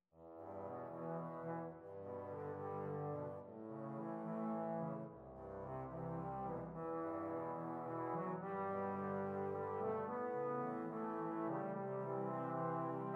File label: trombone quartet